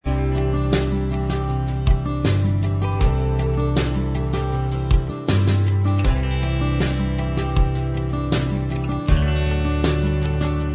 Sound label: Inst Track